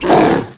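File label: female snarl, creatures; tusken; Star Wars Obi-Wan; XBOX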